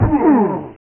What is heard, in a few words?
Running Into A Wall; Dungeon Master and Chaos Strikes Back; Amiga